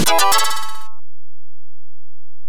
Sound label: Coin; NBA Jam; arcade